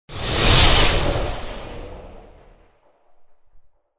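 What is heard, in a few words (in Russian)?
звук приближения воздушного потока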